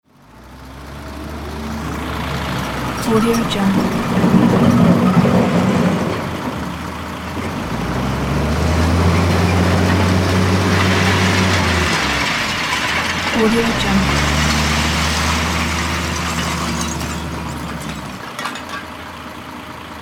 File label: heavy construction machines